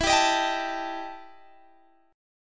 E Minor Major 7th Double Flat 5th, Guitar, Half Step Down